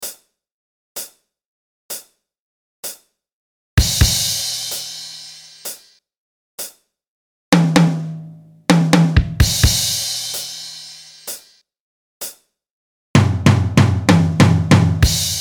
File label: jam drums